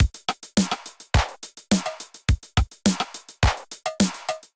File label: 707 Kit groove 105 bpm; MIDI Clips; Cannibals; Ableton; Drum Essentials